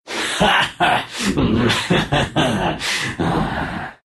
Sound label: laugh ru; Clinkz; dota2